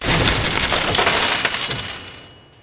impact payphone; Spider Man 1; XBOX

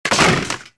bodyfall trooper; stormtrooper

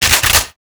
shoot pump shotgun cock; weapons; Max Payne

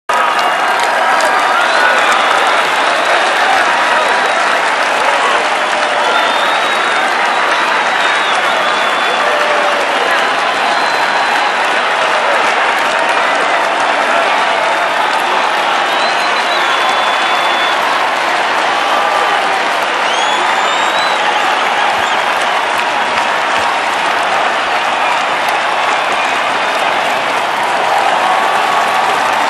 Crowd Huge; Colin McRae Rally 05; XBOX

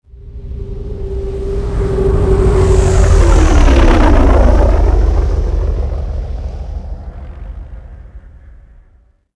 ship passby; vehicles

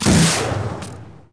lightsaber hit; weapons

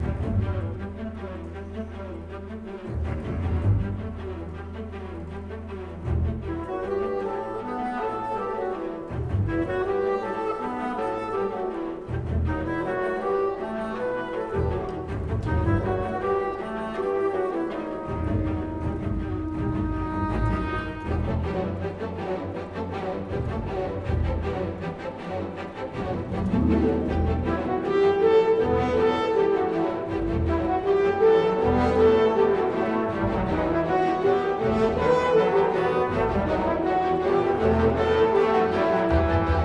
loadscreen, music

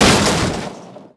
WRECK, Vehicle

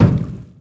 metal hit, impact; Splashdown; XBOX

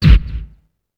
KICK THREAT; UFC Tapout

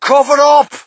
crowd, shout; Mike Tyson Heavyweight Boxing

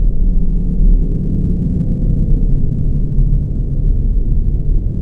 catwalk, ambience; cairn

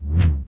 pike swing; Star Wars Obi Wan; XBOX

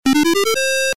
Keno Win

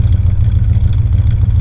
GTO Idle, Engine; Cars; XBOX

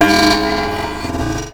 spawn; WhiteOut

